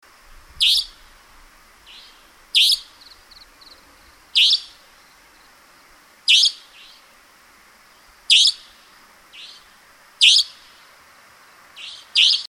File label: savanna nightjar